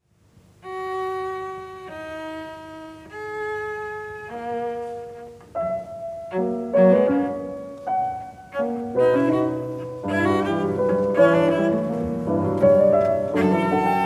Cello Sonata in D Major Op. 102 No. 2 III. Allegro; Beethoven